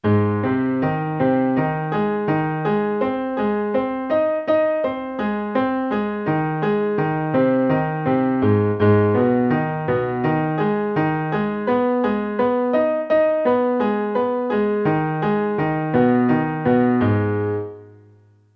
triad and inversion broken chord triplet in A flat and A flat m